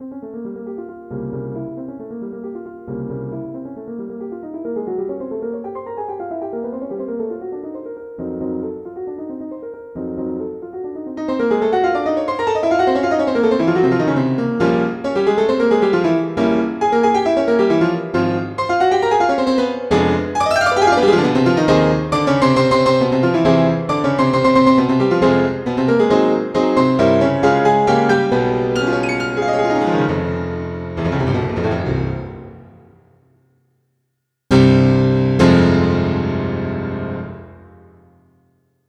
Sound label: Mic Pair; Chopin Prelude